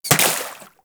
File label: Bullet Impact Water; Warframe; DE; Weapons